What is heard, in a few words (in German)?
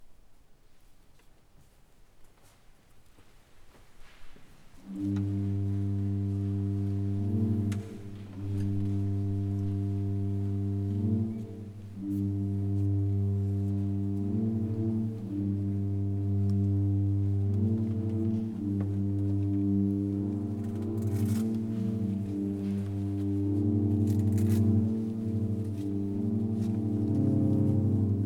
Orgelvorspiel; St. Aegidien; Sommer